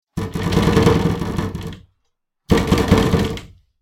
窓 扉のガタガタ音; window

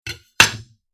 包丁を強めに置く音

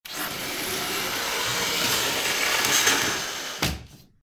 襖扉を閉める音; door

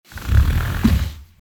畳の椅子を動かす音; chair

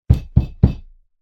外側からを壁を叩く音

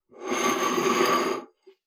食器を引きずる音

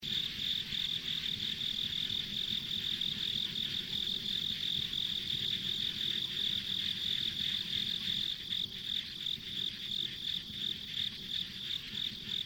田舎道の虫の環境音

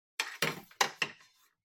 包丁をしまう音

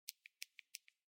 スマホの音量ボタンの音

